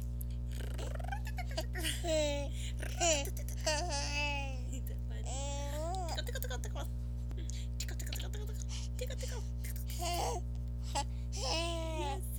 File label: Laughing 4.5 months